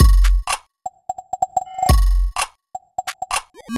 Minimal Funk, 127 BPM, Percussion; Sonic Foundry (Sony Creative Software); Underground UK House & Electro

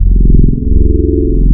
OBERHEIM MATRIX, wavetables

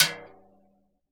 CASCARA, Synclavier Percussion

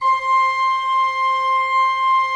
C5, Cathedral Organ; ILIO; Propeller Islands

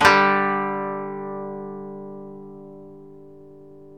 DRONE, Koto

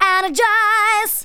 VOCALS SUNG; Techno Trance Essentials

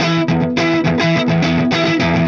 Clinical Distorted Funk Guitar; Sonic Foundry (Sony Creative Software); Indie Rock Anthems; Session 02 - Clinical

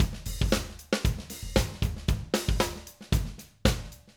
Acoustic Live Drum; 90 s Sample CDs; Platinum Audiolab

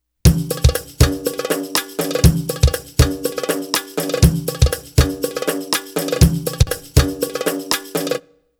Arabic Rhythms

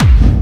hard bass drumz

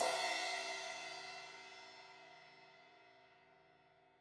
Crash 16in edge, Cymbals; Deeply Sampled Drums

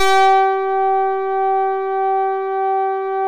EMU MODULAR, modular syn; Sonic Reality; Vintage Timetraveler

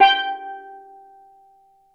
STL. DRUM G3, TONAL PERCS; Crypton - DrumBase5000

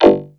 El percu, Yamaha Motif ES, electronic; electronic & zap; Yamaha Motif ES-Series

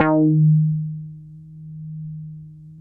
ROLAND JX8P, Vintage Keyboards, EB3; Big Fish Audio - First Call Vintage Keyboards

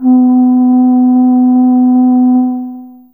Flte C3, Fairlight CMI IIe Wind

